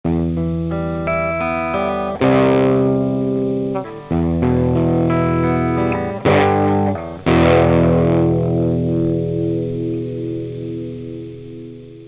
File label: Clean To Dist, Guitar Effects Pedals; Pro Co